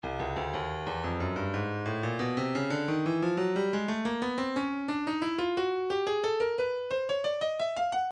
stylus breakbeat midi files 119 bpm; Rat Pack E

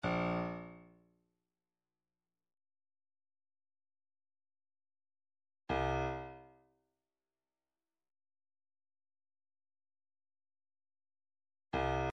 separated elements snare

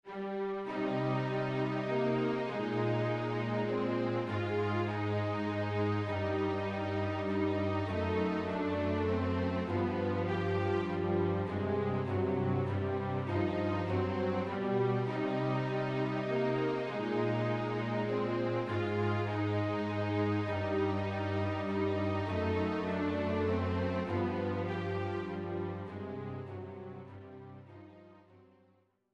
game over; Game System Super Nintendo; Dragon View

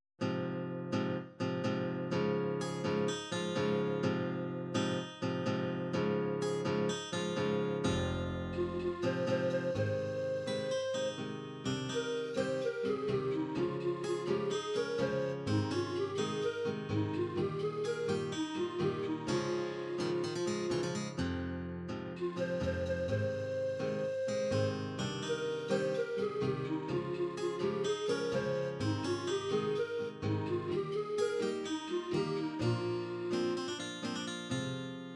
french chanson

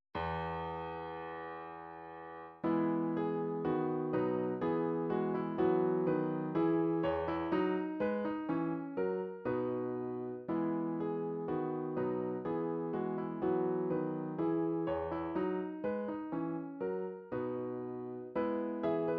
classical, midi

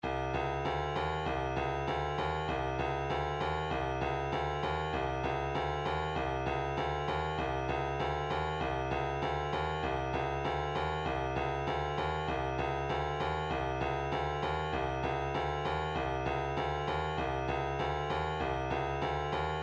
vocal planet glottal, midi

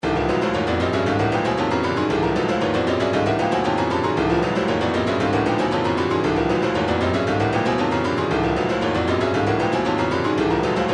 retro funk 116, midi; tower

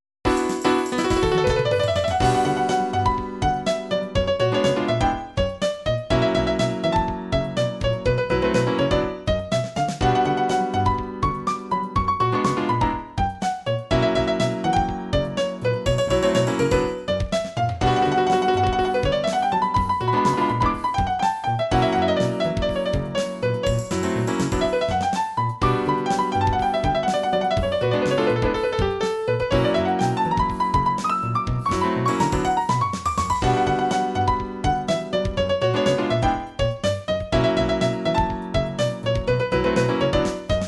piano; pianoses

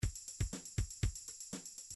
midi drums drums latin soul latin

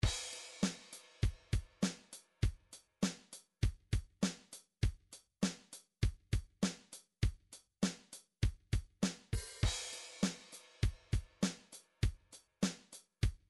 drums rock, midi drums

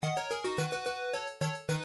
game over; gameboy; balloon kid

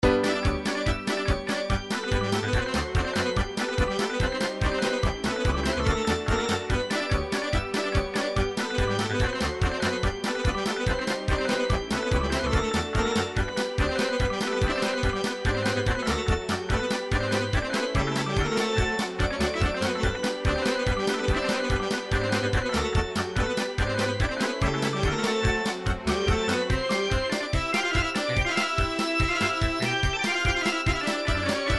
folk srbije kolo, midi

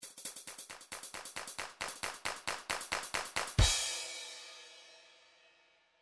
trance midi loops fills clappy; Newtronic Trance Constuction Kit

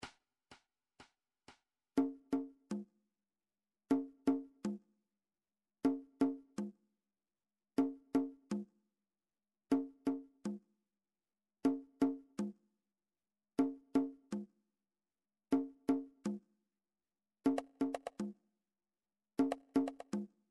drum trax per

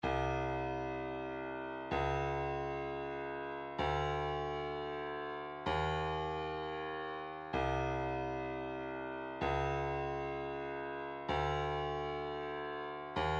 wavedrum, midi; 128 Keep Movin

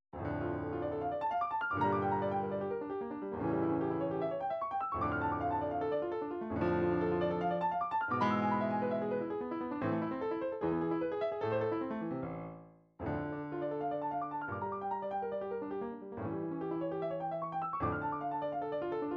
studies for piano, midi

